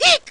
eek; mario

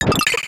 flower pot breaks; smw2

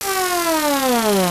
flying attack; kamek; smw2

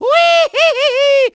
launched; mario; smsunshine

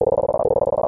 froggy croak; prince; smw2

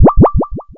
ghost vanishes

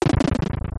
map castle crumbles; smw

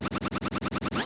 yoshi flutter jump long; smw2

